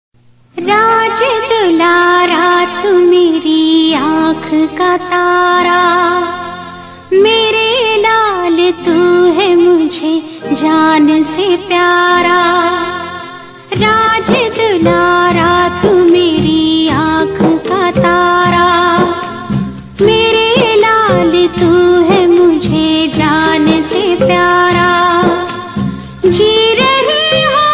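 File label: Sad, Hindi song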